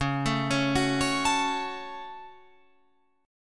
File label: C sharp Suspended 4th Sharp 5th C sharp down Guitar Open F; arpeggiated